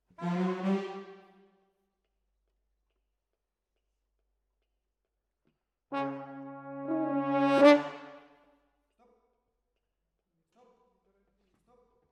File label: Horns